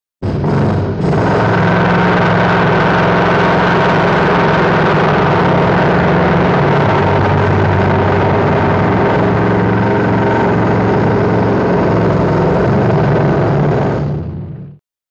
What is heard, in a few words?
Pneumatic Drill; drills